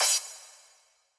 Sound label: crash; osu